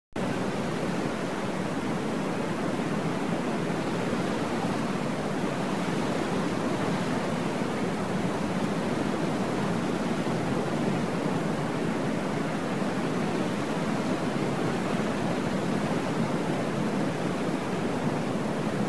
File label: Jungle amb wind